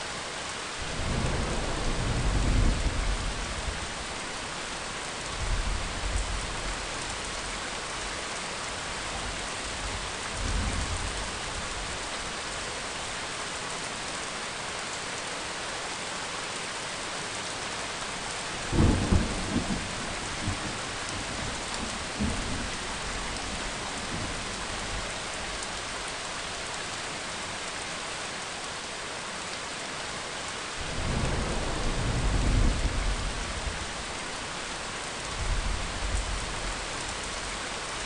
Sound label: rain thunder